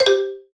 Puzzle Success Xylophone Two Note Decline Bright Dry; stereo; Universal Sound FX